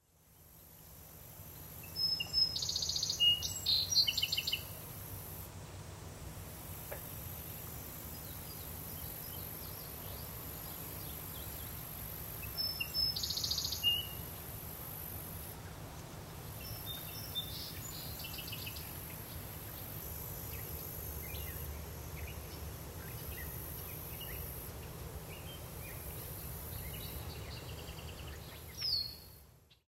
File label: sleep loop binaural beats country day